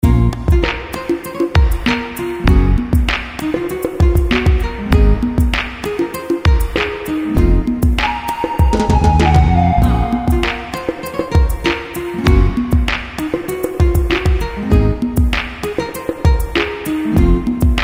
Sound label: soccer Game bg